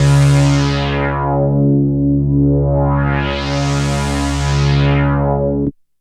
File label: Obi Classic, synths, pads; Sonic Foundry (Sony Creative Software); Electronic Point Blank